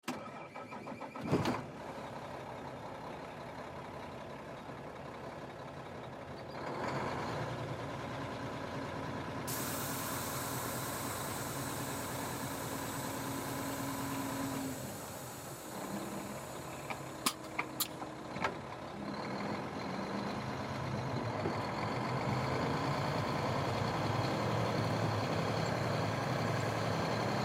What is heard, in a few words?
Semi Truck Mac, Semi Truck Mac E7-460 Start Up Drive Off; Semi Truck Mac E7-460 Interior Start Up Drive Shut Off; Semi Truck Mac E7-460 Ambience Drive Interior; Truck Sound Effects; Blastwave